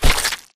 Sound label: Mvmt FS Jog Mud Layer; AI Stalker; Titanfall 2